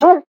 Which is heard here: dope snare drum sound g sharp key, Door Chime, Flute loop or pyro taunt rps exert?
pyro taunt rps exert